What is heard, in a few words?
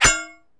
SE GAME BREAK; maimai